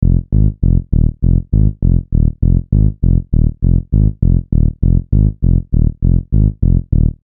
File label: Bass